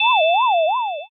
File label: flashback in